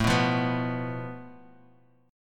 A Suspended 2nd Sharp 5th, Guitar, Standard; Asus2#5; strummed; 5,2,3,4,x,x; JGuitar

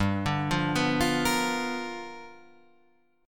G 7th Sharp 9th G, Guitar Half Step Down

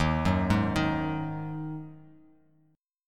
Eb Minor Double Flat 5th Eb Bass Standard; JGuitar

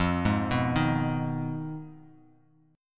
F Minor 7th Flat 5th F down Bass Standard; Fm7b5 arpeggiated; JGuitar

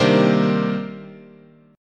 C Minor 9th, guitar chord

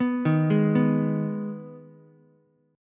Eb Suspended 4th Sharp 5th Eb Ukulele; Ebsus4#5 arpeggiated; JGuitar

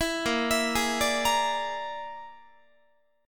A sharp 7th Flat 5th, Guitar chord; A#7b5; arpeggiated

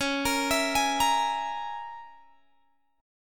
Db Minor 6th Db down Guitar Open G

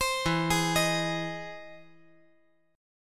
E Augmented Major 7th E down

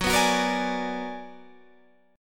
B-Minor Major 13th

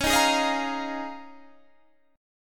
Eb 9th Suspended 4th

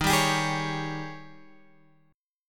D sharp 11th D sharp; D#11 Chord {11 10 X 12 9 9} strummed

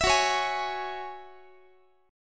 F sharp 7th Suspended 2nd F sharp down Guitar; F#7sus2 strummed

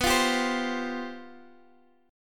C Suspended 4th B; Csus/B strummed; JGuitar

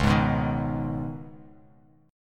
C sharp 7th Suspended 2nd, C sharp, down; strummed; chord

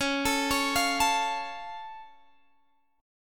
Db Augmented, down, Guitar, Standard; arpeggiated; JGuitar

